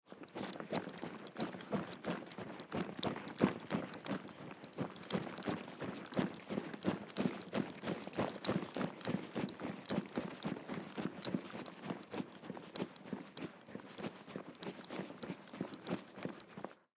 Duffle Bag Run Long; Rustling; Foley; Sound Effects:Objects; SoundEffects-Blastwave

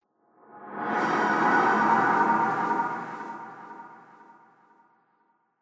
Subtle Sorcery, magic sound effect; Fantasy Sound Effect; Andrew Fleming

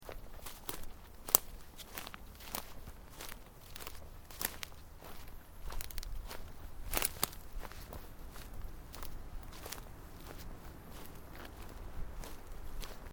Footsteps Boots Underbrush Sequence; Footstep Foley; Footsteps (Sound Effects:Human)

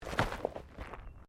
Body movement Impact Roll Gravel; Body movement foley to simulate falls and deaths; Rolling; Objects

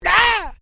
Created Creature Small Ouch, Alien Sound Effects; Created creatures, vocalisations and pretend speech

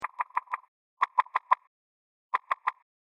Woodpeckers In Space, Sci Fi Music; science fiction sound effect

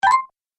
Toy Spell, Magic; Santa

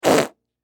Cartoon Whoopee Cushion Fart; Cartoons; Miscellaneous Cartoon

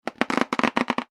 balloon rubbing squeak; Balloon Being Rubbed With Hands - Good Cartoon Stretching / Expanding Sound; Balloons (Sound Effects:Cartoons); McKinney Sound

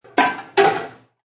Pot bangs twice.; Pots and Pans; Restaurants

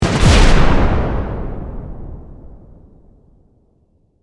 Explosion Heavy; Bomb Sound Effects; Explosions

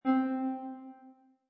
Musical, Button sound or menu sound; Multimedia; Button Sound Effects

